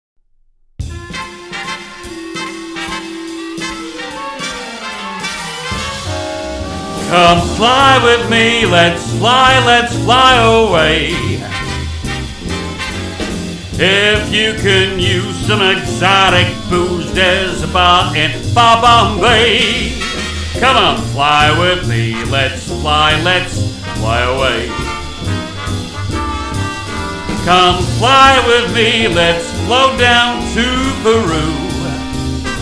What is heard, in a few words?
singing; singing demo